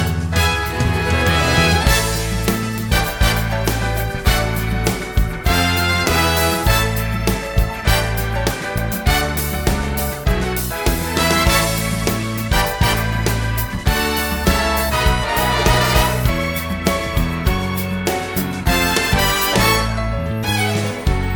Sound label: accompaniment